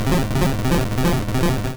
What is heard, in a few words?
Razor Leaf; Pokemon; Gen 1; attack moves; RBY